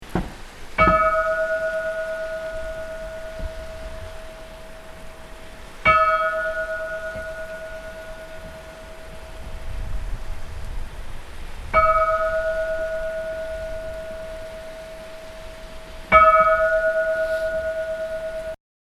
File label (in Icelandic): storavatnshornskirkja likhringing